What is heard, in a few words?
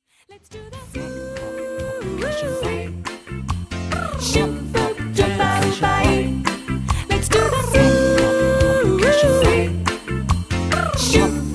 Percussion Pop